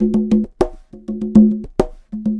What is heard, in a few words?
Conga Loop (100BPM); musicradar